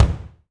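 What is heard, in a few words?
BD, bass drum; Best Service Gigapack 2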